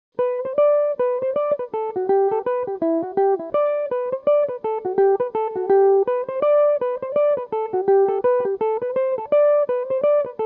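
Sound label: reel G major, mandolin; Belmuse EM-190 electric mandolin; The Nocturnal Reel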